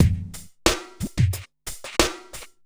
Street Tip, R&B Drums

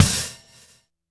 kick, Hardcore Breakbeat, 134 Emin; Big Fish Audio; Hardcore Breakbeat Action; Mellow Jack

